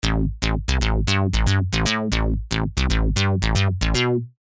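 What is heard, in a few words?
bass, Refractive Index; tropical pops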